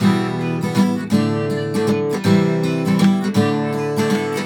EMGTR; USB Soundscan vol.42; AKAI

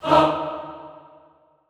HAH, Classical Choir; Best Service

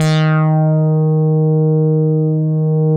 MINIMOOG

